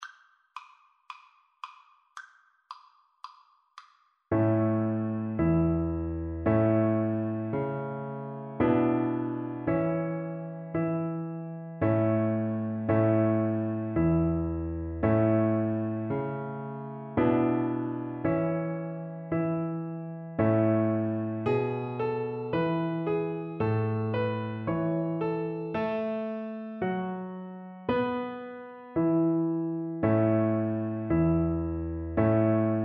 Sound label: deck the halls, Double Bass